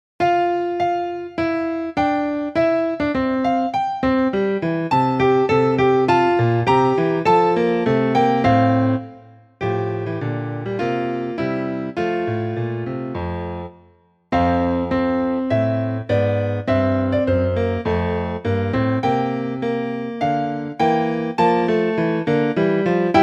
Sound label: American Anthem Mashup, counterpoint